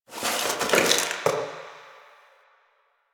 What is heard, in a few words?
Box Of Trash Rustle